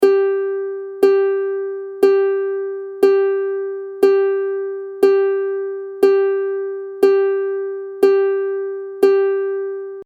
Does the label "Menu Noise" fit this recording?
No